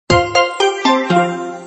notification

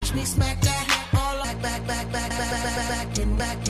remix

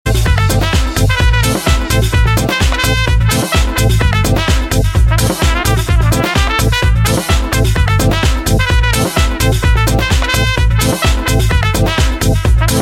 Trumpeet

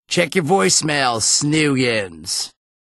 Voicemail Tone

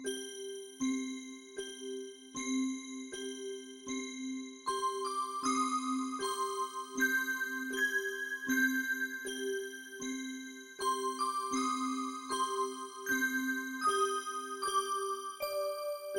Haunted Nursery

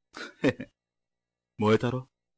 Hehe

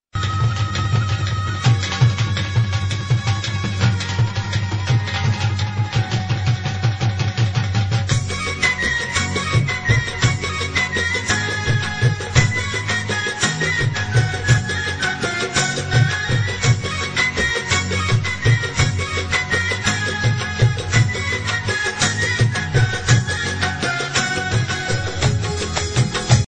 Dhol

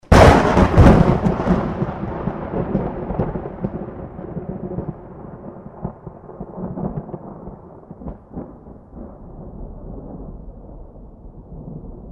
Thunder Clap

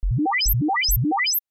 Three Sweeps

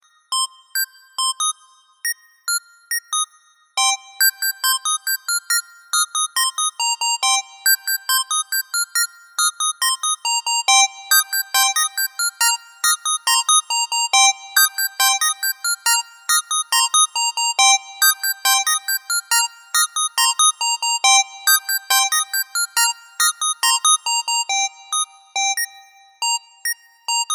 Deep Teeth Sound

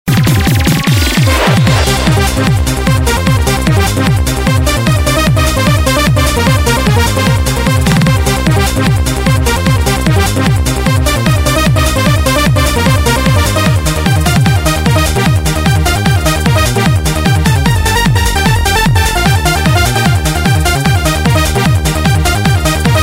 Electro

